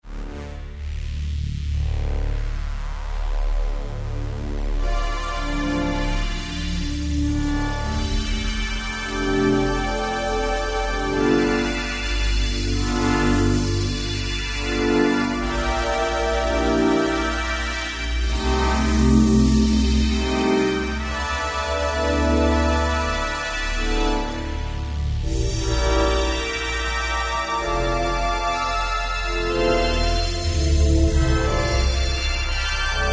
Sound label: Filterphaser